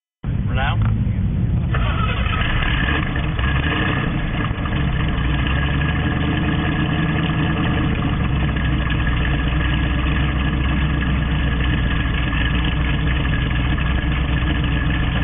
camaro, exhaust systems

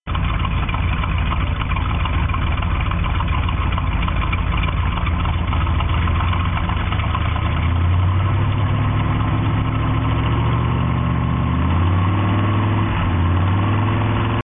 hurst olds, exhaust systems

